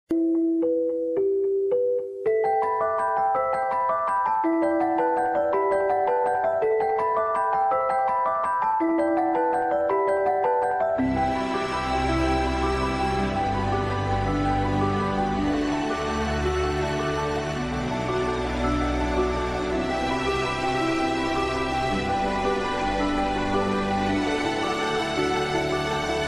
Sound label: Samsung A8 Ringtone